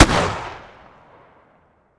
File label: shotgun sup fire atmo ext; gmod; viper